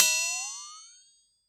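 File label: Flex Metal, Roland SPD-S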